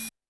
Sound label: Roland TD-20 Percussion